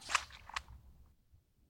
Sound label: Crossvec Equip